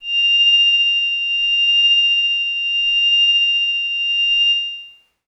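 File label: Glass Howl Kawaii A sharp 6; pads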